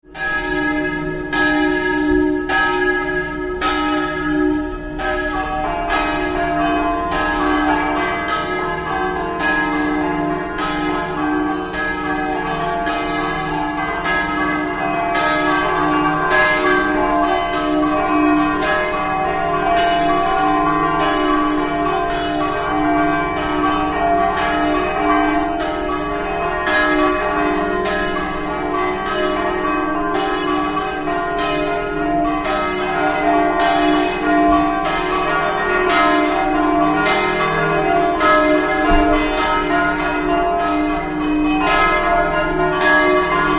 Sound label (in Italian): campane